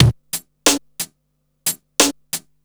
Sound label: Smooth, R&B Drums